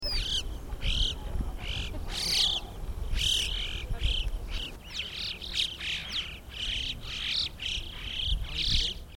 alpendohlen

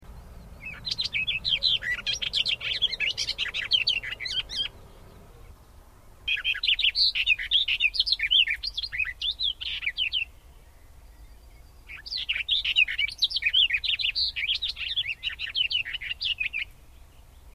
sperbergrasmuecke

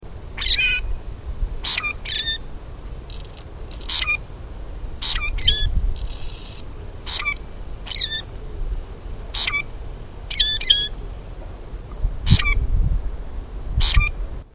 kanarenraubwuerger, vogelstimmen

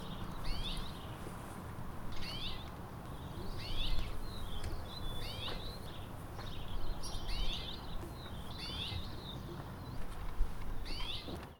steinsperling

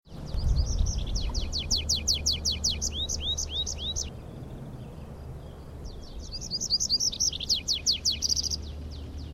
baumpieper

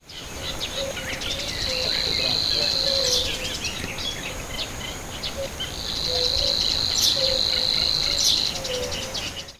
Rohrschwirl

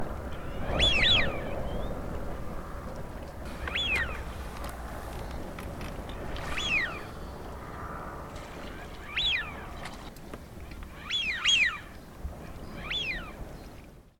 pfeifente

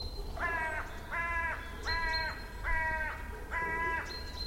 rabenkraehe

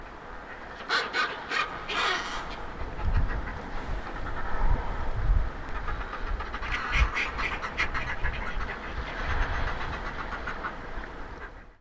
eissturmvogel

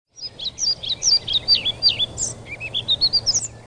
trauerschnaepper

